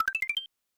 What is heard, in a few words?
1-UP; Super Mario All-Stars; SNES